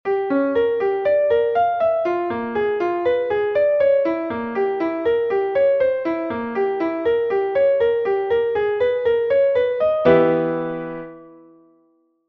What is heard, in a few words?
chord progression, guitar